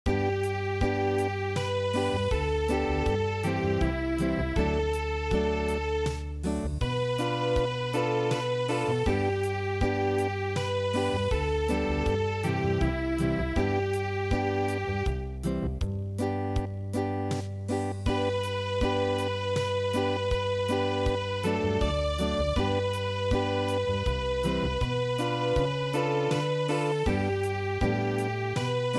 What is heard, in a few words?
Acoustic, traditional; Tenan